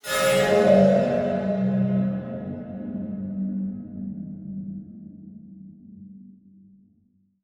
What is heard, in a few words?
Transition Hit Impact; Production Element